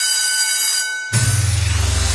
bigwin intro

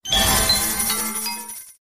super win

